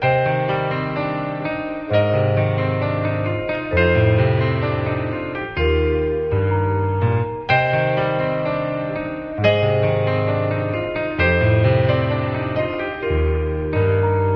piano, Loops Sad

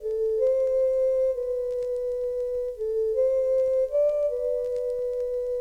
flute, Sad

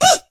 woo female; ooh ahh; ho hoo woo

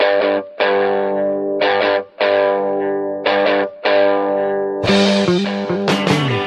guitar, Phonky loops

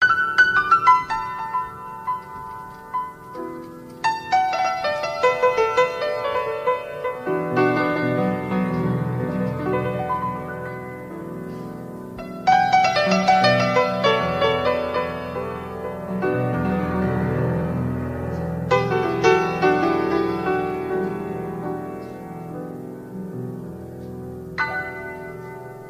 piano beginning

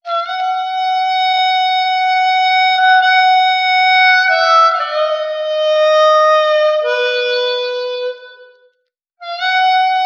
sax actually harmonica, loops, phonky

